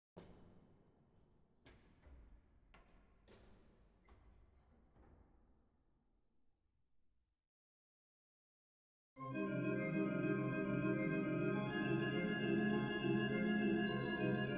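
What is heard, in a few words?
Preludium C Minor, organ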